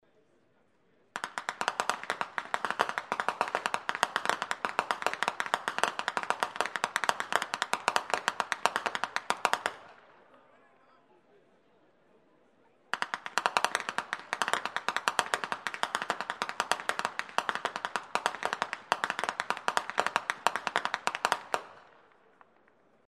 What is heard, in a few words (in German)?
schnalzen; rupertigau